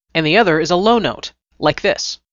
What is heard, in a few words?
Tone Nontarget